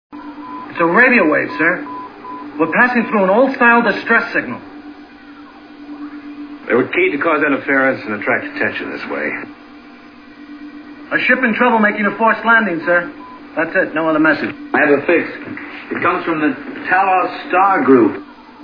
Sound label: distress